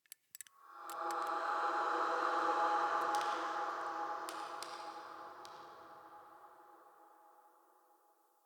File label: Helmet Idle; Friendly; Tuvalkane; Discovery